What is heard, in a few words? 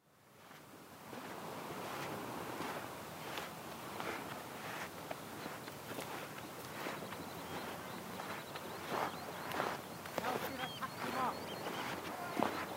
Larks and Oystercatchers